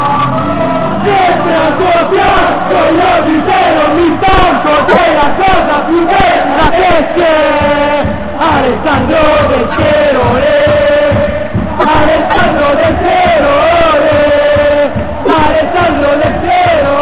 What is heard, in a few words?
Ragtime